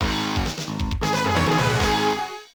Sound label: Tournament Fanfare; Ultra Street Fighter II The Final Challengers